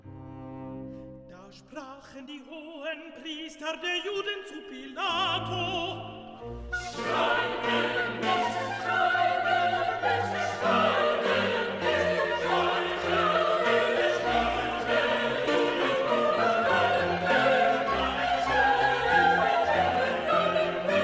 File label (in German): BASS, Stimmen mit Klavier; Johannes Passion